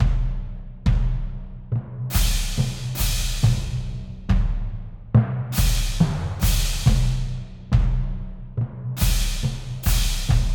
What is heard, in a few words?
perc; Sky Diving